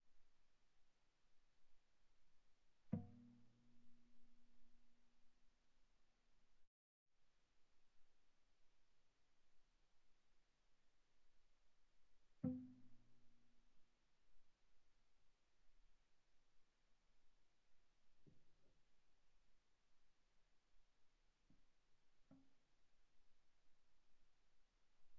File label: Viola pizz sul G pp C5 G5 stereo